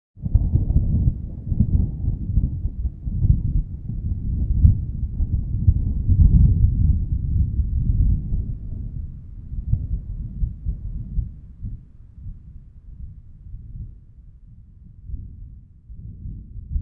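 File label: thunder distant; ambient soundscapes; dota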